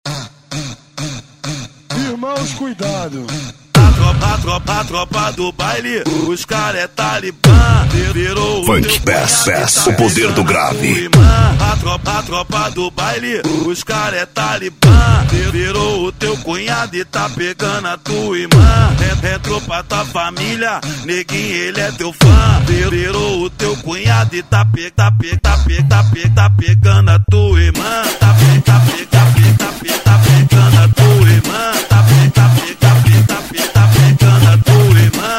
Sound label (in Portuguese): funk bass o poder do grave